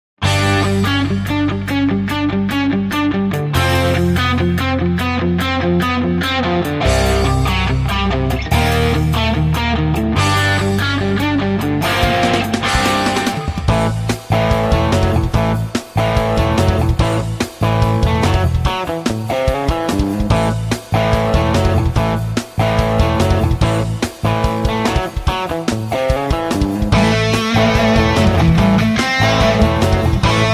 ROCK GUITAR RIFFS

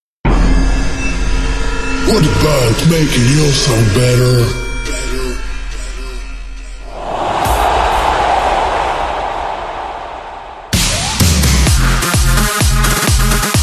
EDM SNARES N CROWDS

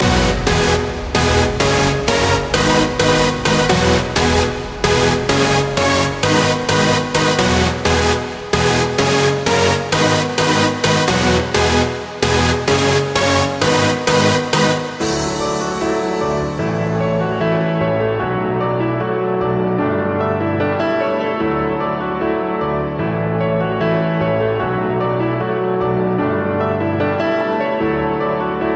PIANO SESSIONS; DARWIN PRESENTS

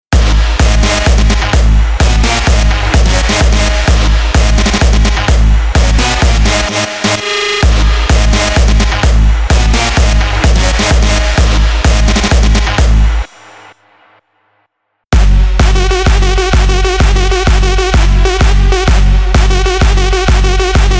Big Room Drops

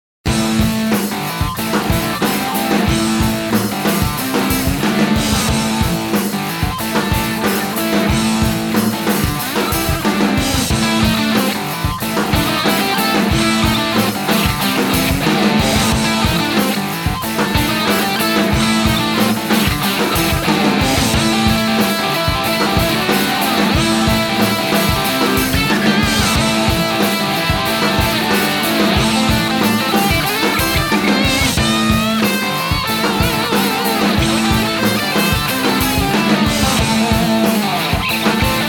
Rock Guitar Attack

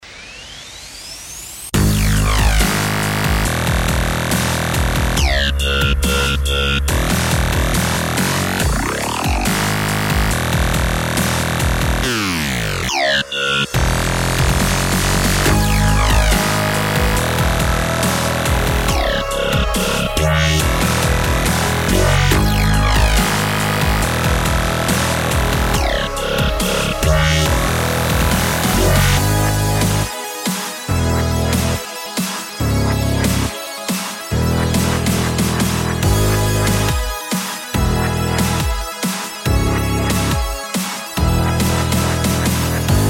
RAZOR DUBSTEP; NI

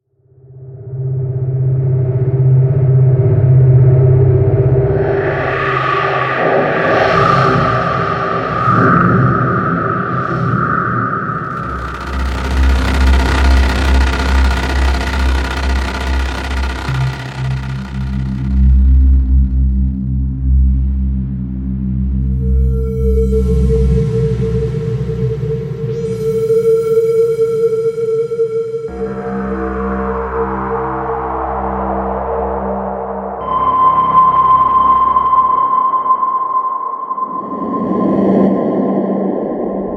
ALIEN WORLDS MASSIVE SOUNDS; ICE PLANET MUSIC; NI

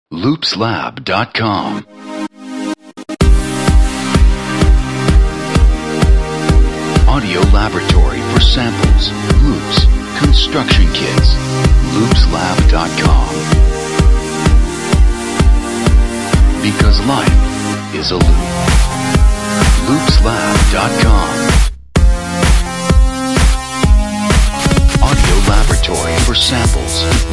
House Synth Loops

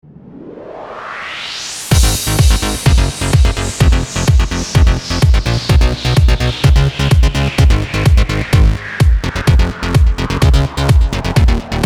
Electro; Electrolysis

